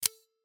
switchblades otf quality close unsheath mechanical tight spring ringing; sonniss gdc